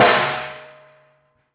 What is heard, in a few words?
Prepared Drums